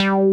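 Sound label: JX MOOG BASS; Pro-Rec - Synthsonic; TRINITY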